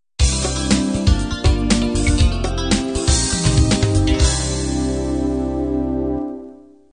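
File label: standard 16 beat end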